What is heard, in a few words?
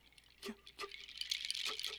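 Music Village Calm Near; From Dust; ps3; xbox 360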